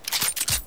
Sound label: empty, weapons